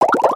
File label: text bubble pops bubbling cute; kids game language; instant message